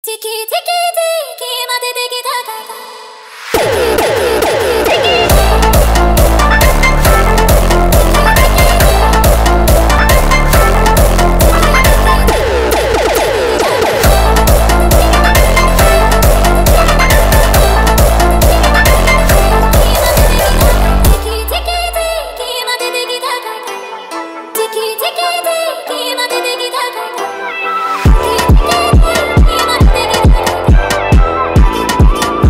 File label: jumpstyle sped up